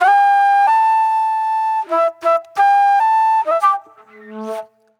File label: Pop Flute